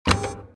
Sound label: button; Star Wars Jedi Knight II Jedi Outcast; XBOX; switches